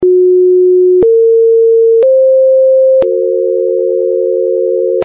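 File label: F Sharp Pythagorean; Temperament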